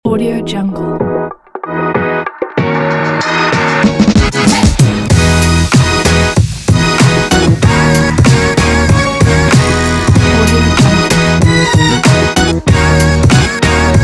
hip hop

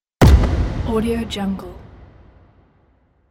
impact noise; 3dhome

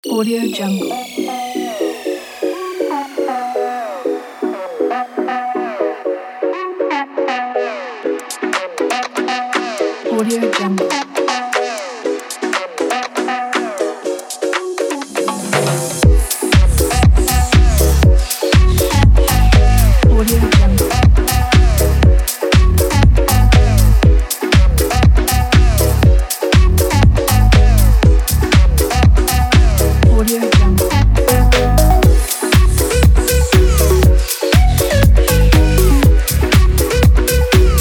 house sweet beat

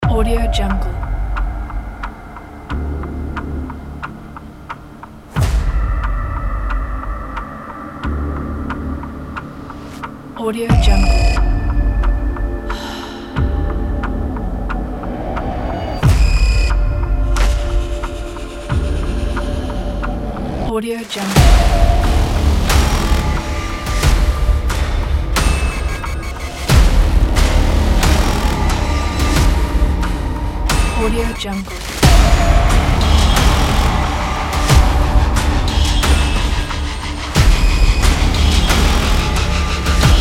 countdown tension cinematic trailer

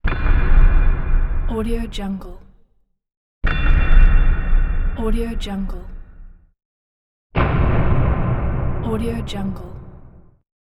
horror impact

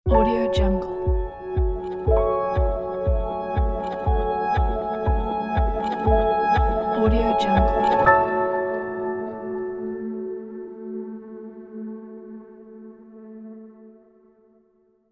ambient logo

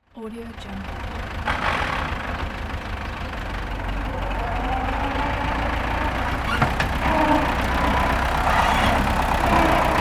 tractor

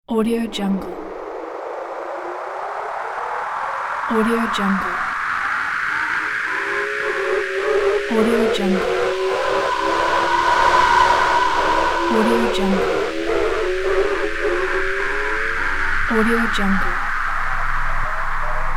aliens arrived

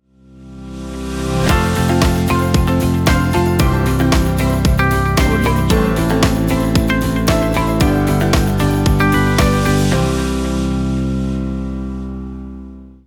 television logo

